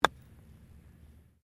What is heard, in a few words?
Golf Swing Wedge Fairway; Various Golf sounds; Sports